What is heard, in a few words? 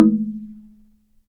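Slit Drums, soft; Roland LCDP11 Africa VOL-1; Wood & Metal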